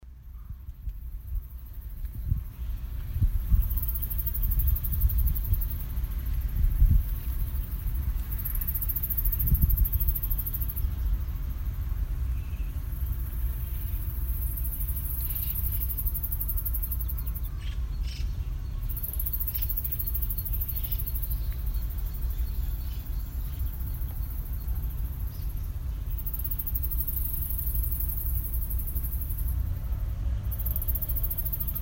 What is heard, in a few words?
grasshopper